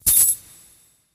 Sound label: coins sound; Infernal Radiation; macos